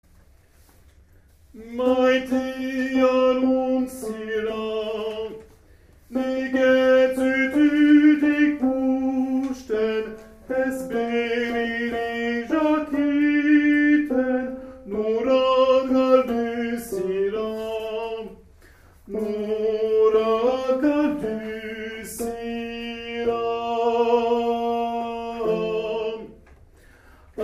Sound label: Tenors; Maïtia nun zira